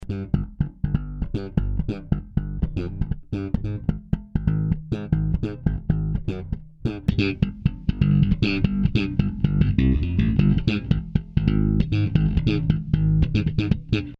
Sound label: bass; レコーディング